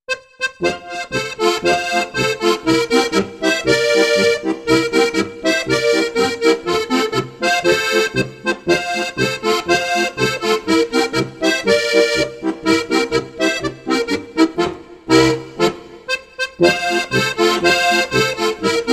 polka

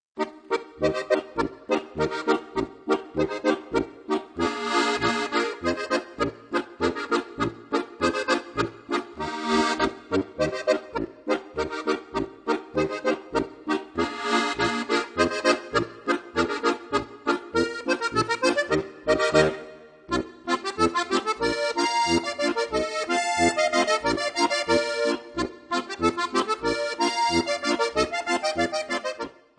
bayrisch polka

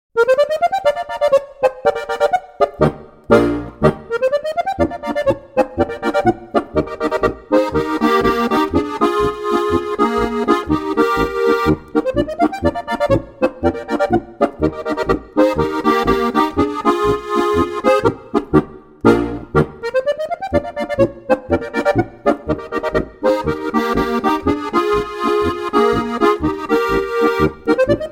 polka; grossglockner blick polka

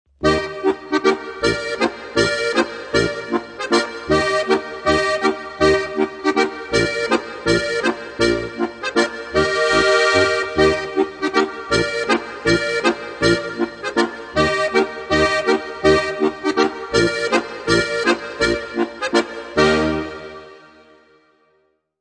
zwiefacher; Volksmusik- leicht gemacht

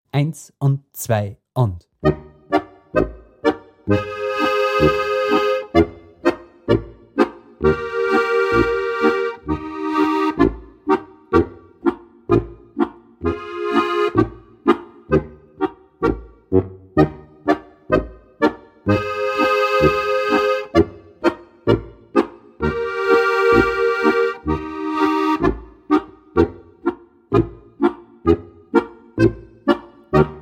harmonika boarisch; Die Michlbauer Methode 2

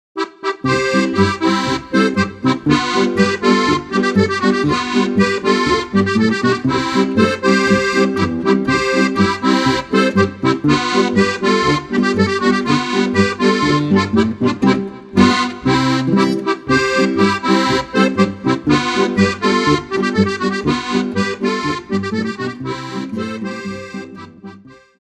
polka

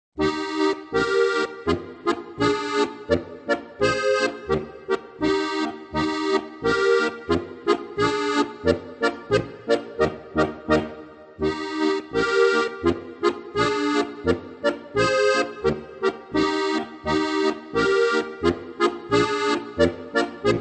polka; Mein erstes Spielbuch

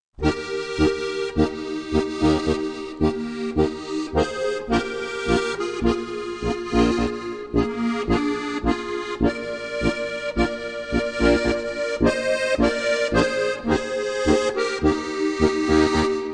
tango

